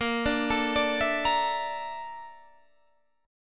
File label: Bb Major 7th Flat 5th, Guitar, DADGAD